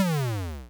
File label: move; Wicked-Depths; Gamejam build